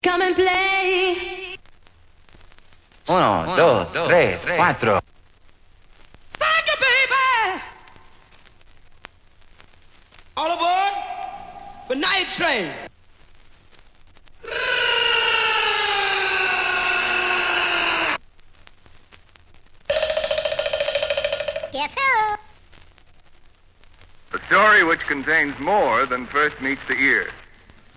samples scratches

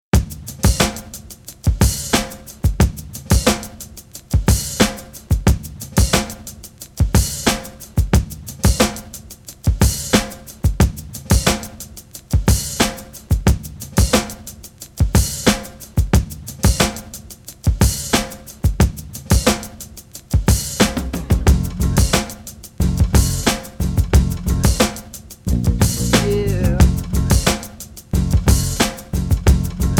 instrumental loop

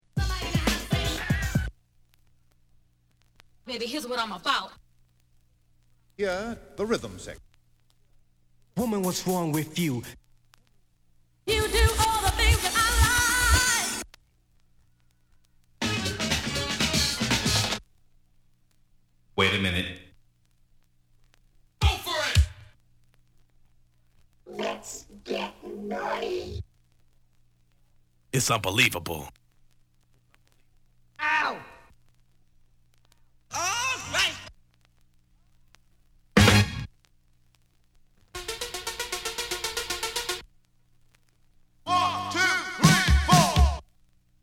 samples scratches; wicked mix vol 016

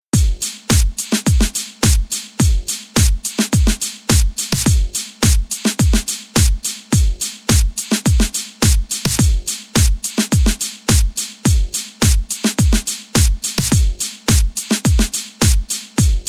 bpm 106; full tilt remix vol 044; quick edit

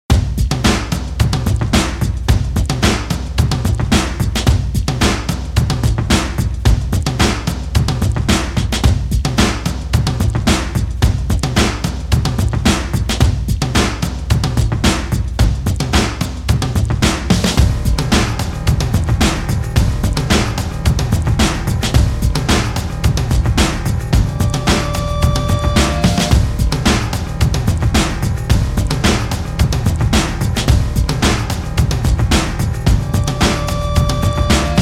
bpm 107

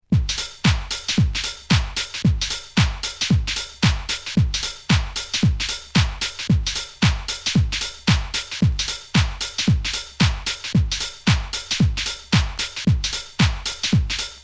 beat

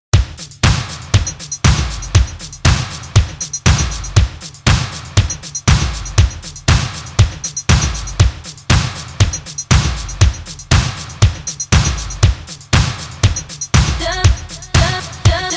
bpm 119

